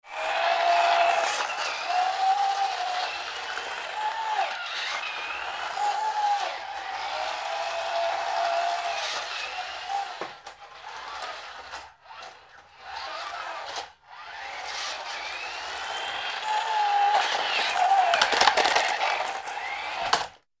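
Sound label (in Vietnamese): Tiếng lái xe ô tô đồ chơi điều khiển từ xa trong phòng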